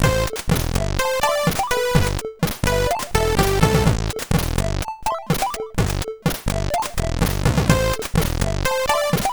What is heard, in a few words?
Rain Walk, CSG8580R5